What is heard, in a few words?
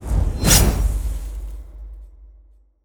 shadow explosive kunai throw